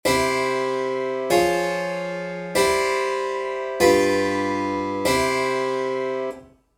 neapolitan in major

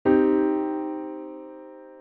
chord Cm

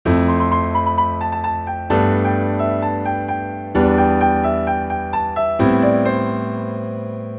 melody

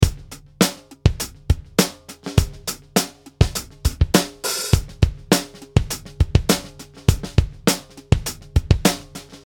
ghost drum

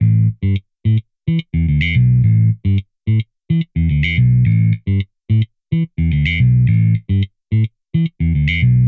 bass ghost

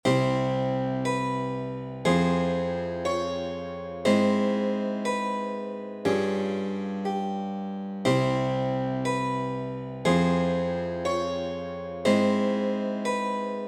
arabic diatonic progression

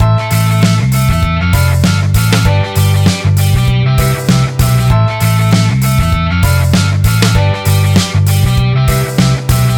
synco synco

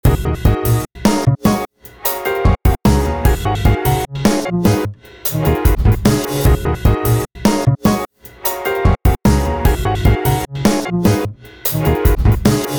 band gated

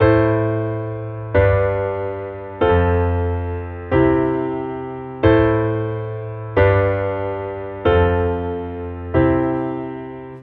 piano

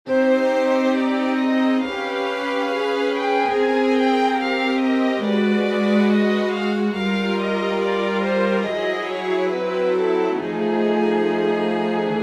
aug6 ensemble

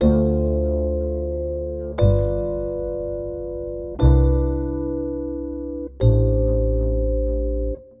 omit root

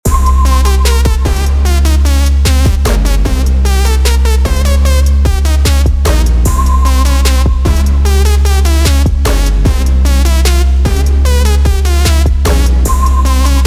make scales trap

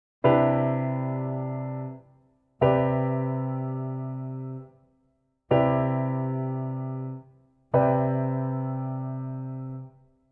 voicing stacking 9

